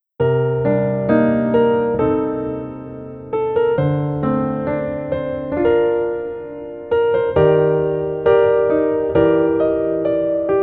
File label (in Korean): Piano ver 멜로디MR